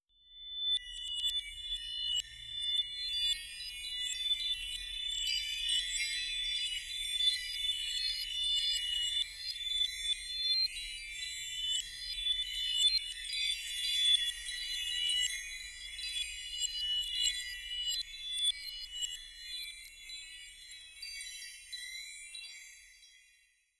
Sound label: Chimes; Legend of Keepers (gamerip) (2019)